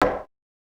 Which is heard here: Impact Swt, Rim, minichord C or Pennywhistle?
Rim